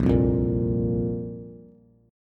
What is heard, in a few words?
Bb Suspended 2nd Bb down Bass; strummed